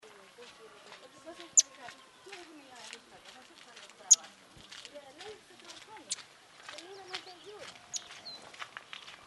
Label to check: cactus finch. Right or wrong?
Right